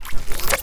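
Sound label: charm, attack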